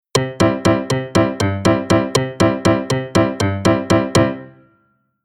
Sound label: Rhythm